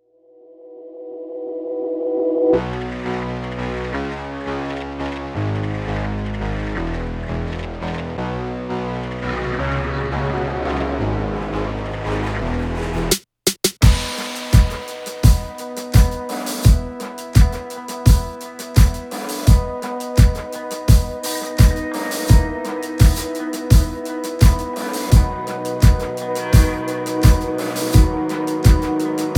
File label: instrumental